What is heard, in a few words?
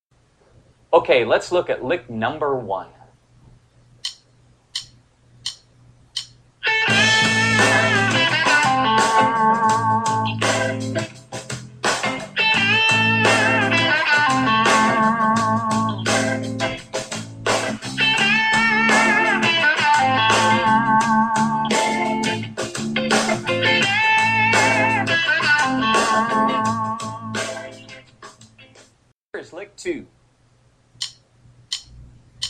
three extended pentatonic lick